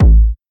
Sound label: old school bassy kick drum A key